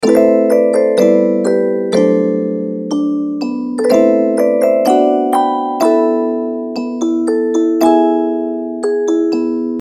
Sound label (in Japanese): ミステリー オルゴール